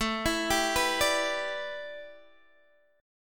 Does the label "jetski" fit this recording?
No